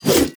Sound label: ultimate attack impact; Idle Champions of the Forgotten Realms